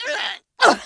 Air Bail Female; Kelly Slater Pro Surfer

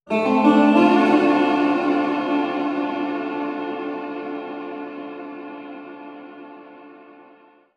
Guitar; Type:Rider; PS Vita; Guitar Clarendon 03

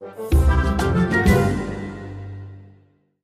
Level Start Foam; Scrubby Dubby Saga; Android

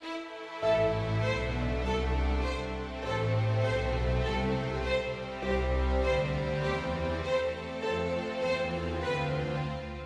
adventure forte start

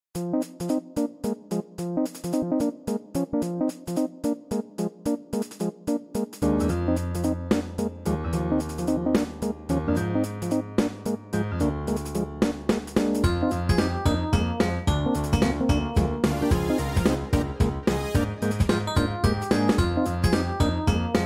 Jazzy

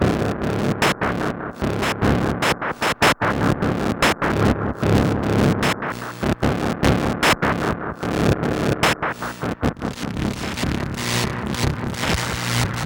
Beat Dist

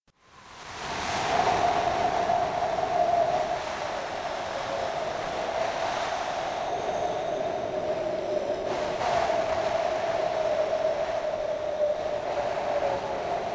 Wind

